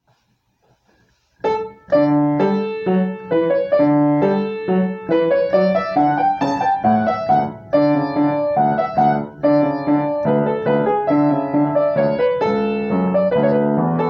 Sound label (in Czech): Pochod D dur; C. Ph. E. Bach